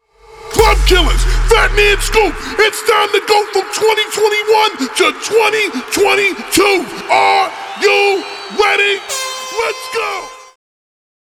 NEW YEAR COUNTDOWN 76 BPM; CLUB KILLERS

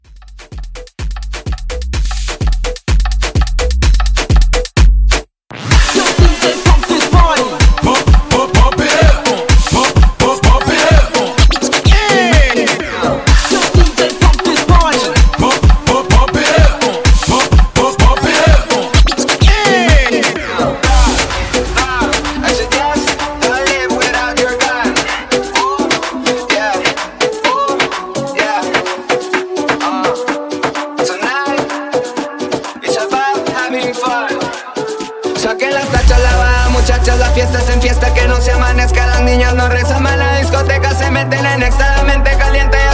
Afro Hype Edit

